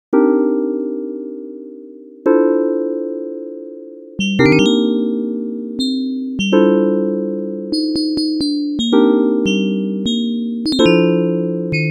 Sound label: medium depth house opl1; weeklybeats 2026